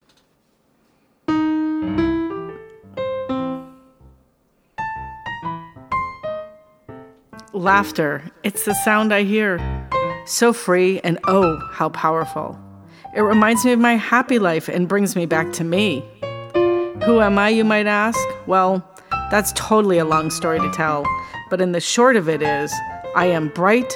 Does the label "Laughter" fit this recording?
Yes